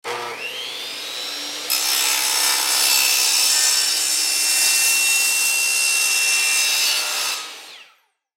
Chop Saw, Chop Saw 10 In Turn On Cut Pvc Pipe Turn Off; Chop Saw 10 In Turn On Cut Pvc Pipe Turn Off Dewalt; Industry; Saws; Blastwave